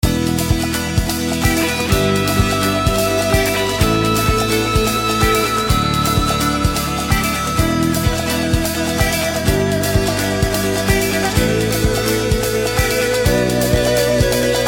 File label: bgm field; goken